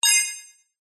multimedia game tone sci fi futuristic beep positive